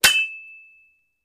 Fencing Clanks Singl, swords

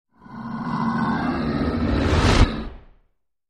Animal Stinger; stingers & whooshes

